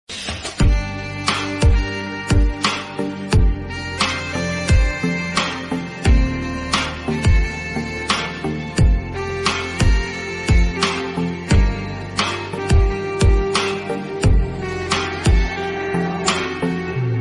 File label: Instrumental Song Ringtone; Maine Pyar Kiya